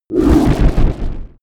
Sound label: energy shield hit; energie